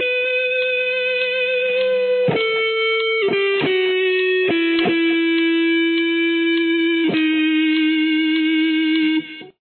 lick, guitar

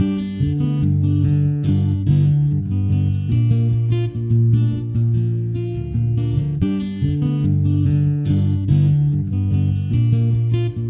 chorus, guitar

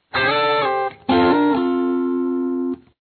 outro lead

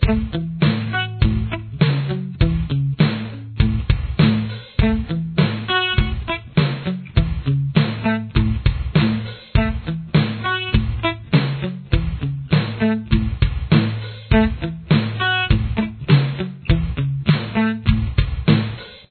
solo guitar

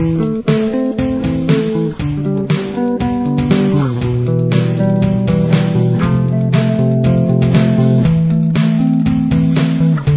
solo bass

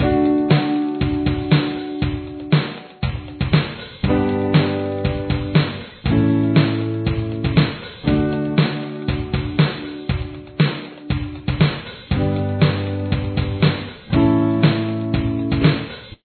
chorus guitar

